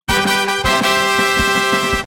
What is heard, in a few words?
tada fanfare; koiroylers